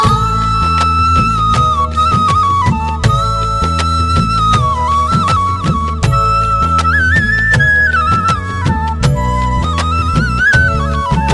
bansuri

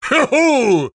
king congrats

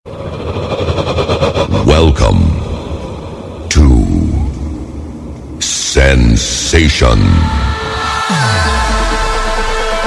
anthem, electronic ringtones; sensation

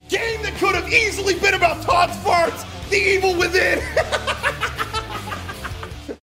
Farts; Mega64 Todds

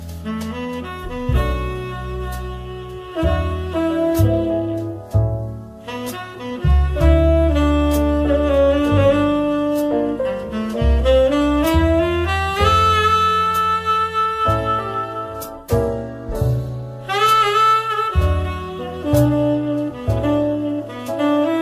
Theme Jazz

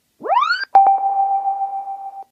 Beats Pill Turn on

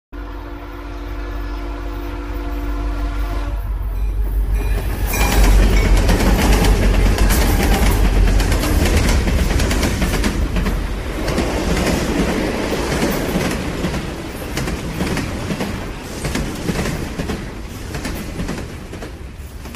train 3d sound

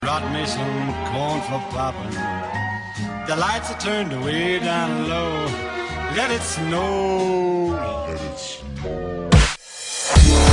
jazz; holiday

